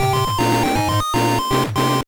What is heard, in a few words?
Jingle, gba